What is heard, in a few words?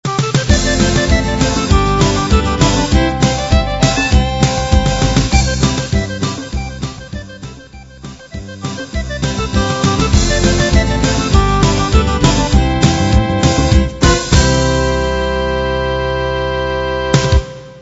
Zydeco